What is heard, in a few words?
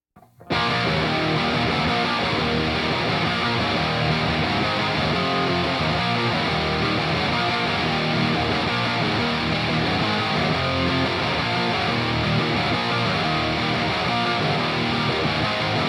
guitar